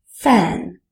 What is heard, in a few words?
fan